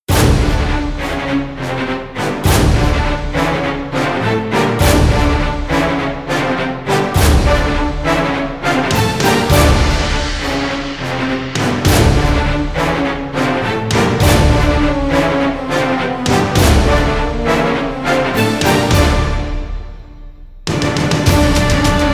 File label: no choir